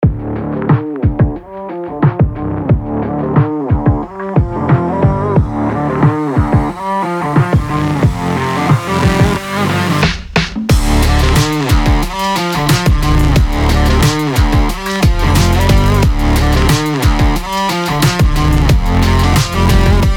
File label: stock music